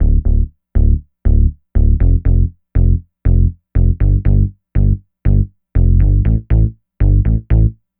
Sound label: Synth Bass; Bass X